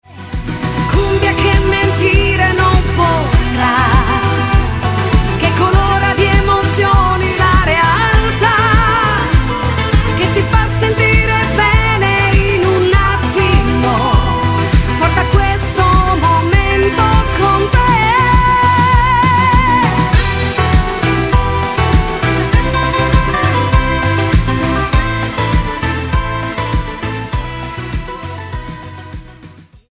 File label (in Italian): Cumbia